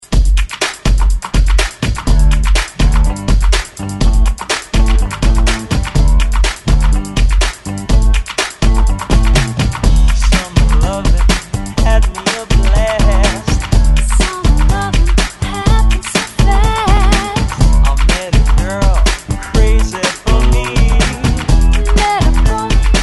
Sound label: 70s ReDrum 124Bpm; DJDOLLS